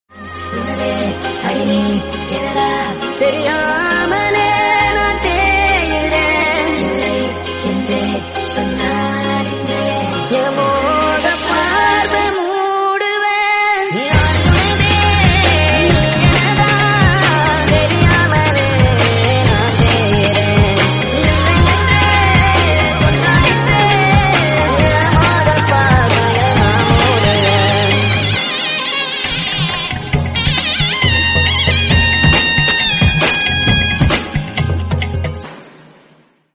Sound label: Tamil Trending Songs; Instagram